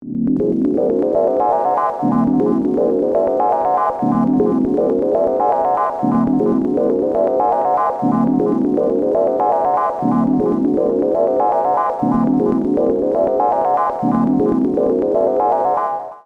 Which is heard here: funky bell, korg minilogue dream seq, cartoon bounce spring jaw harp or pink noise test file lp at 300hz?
korg minilogue dream seq